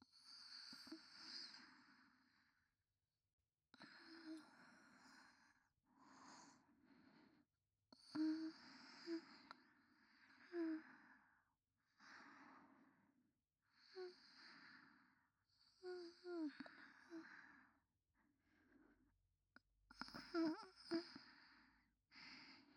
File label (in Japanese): 寝息・彼氏と一緒に穏やかに眠っている彼女; おとなし少女系カノジョ